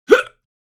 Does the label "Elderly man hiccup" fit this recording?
Yes